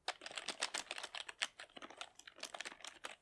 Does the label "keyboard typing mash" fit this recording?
Yes